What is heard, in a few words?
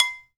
Kitchen Foley Dry Drums; Antidote Advent; Kitchen Foley Sounds & Loops